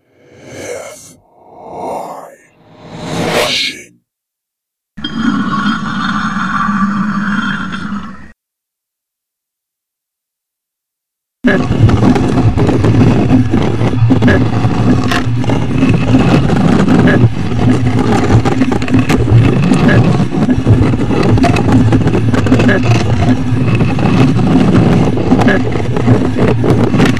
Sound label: rumble